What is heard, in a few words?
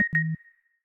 Signal, notifications; Samsung Galaxy S25 Ultra